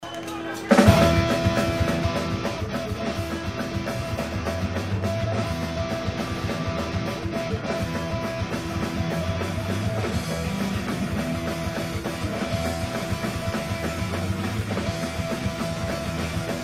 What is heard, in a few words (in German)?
instrumental; oma hans abschied in der fabrik